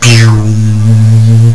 Transport; Star Fighters 1.6

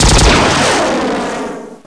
shot ion

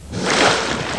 geyser start; Ferazel's Wand Demo

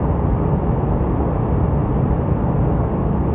air; CRC2005; CRC 2005 Demo

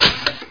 mission sound weld bad

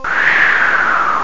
swoosh; The Epic Collection 3; Epic Marketing; muzak samples

